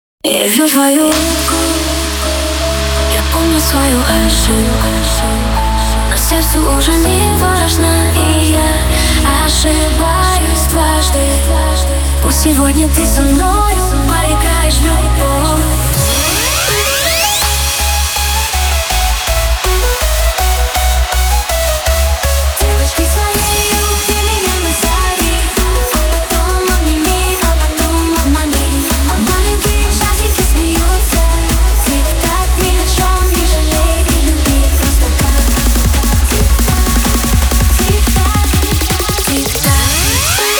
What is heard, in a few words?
HARDSTYLE